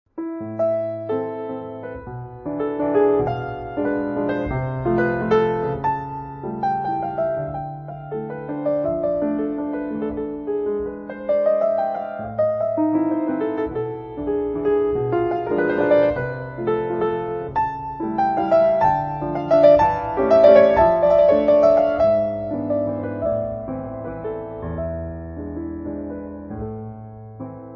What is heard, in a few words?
music relaxation